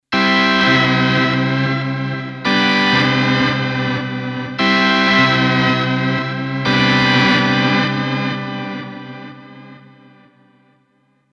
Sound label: Space Chorus